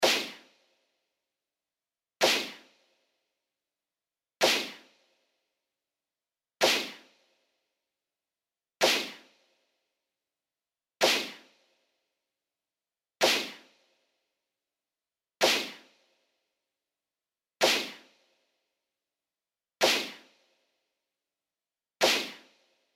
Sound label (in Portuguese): amostra de pulsos com periodicidade